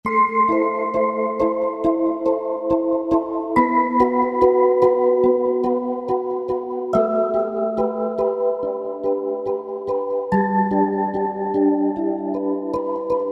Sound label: Clear Mallet, Korg TR-Rack